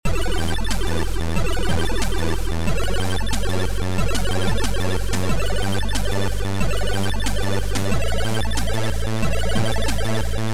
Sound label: Karaoke song